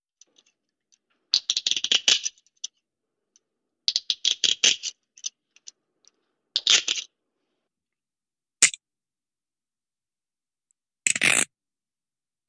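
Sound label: Handcuffs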